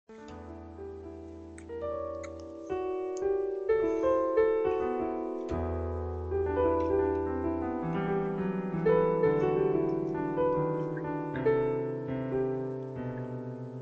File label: piano